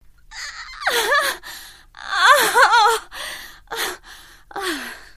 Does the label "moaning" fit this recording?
Yes